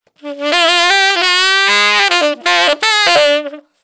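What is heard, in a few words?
125 NuJazz Sax D major; Brass; Digidesign; Pro Tools; Pro Tools Essential Loops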